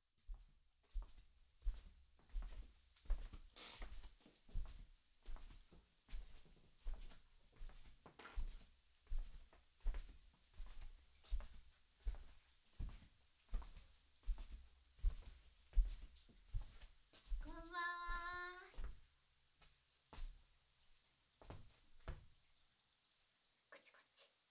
お試しマッサージ

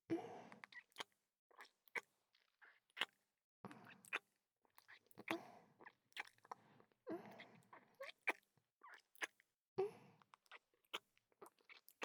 キス音のみ

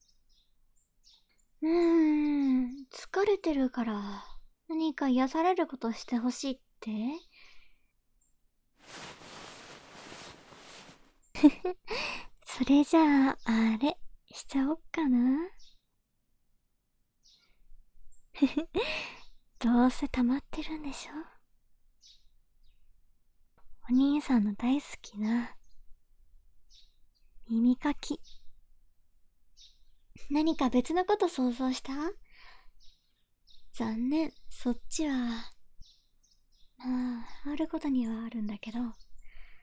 耳かき

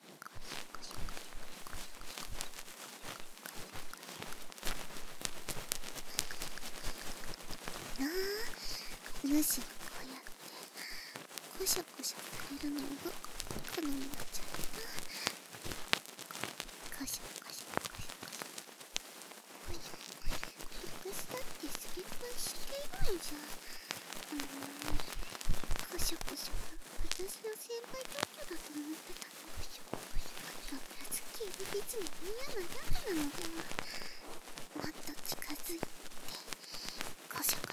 両耳梵天; きいろ&ピンクお狐様

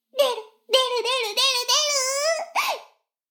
シコシコ音声; 逆時間停止されて

